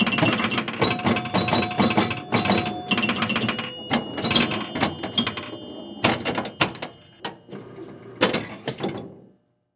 Technology Electronic Pinball Machine Played Rapid Fire